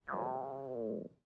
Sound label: ui low food